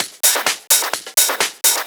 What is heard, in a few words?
Discohouse Loop 128 BPM